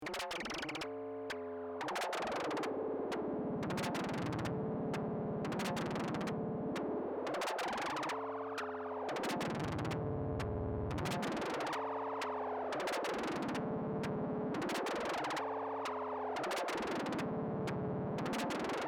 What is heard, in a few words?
Buchla Bite